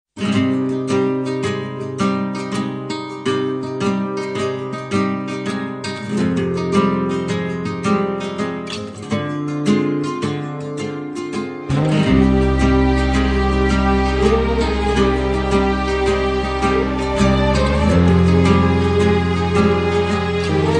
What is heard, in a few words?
Lovely Music